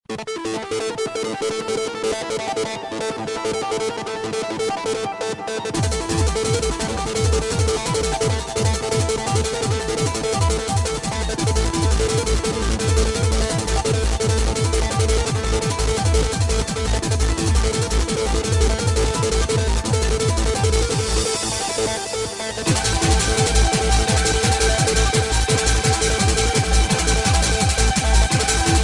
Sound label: bass ringtone; road warrior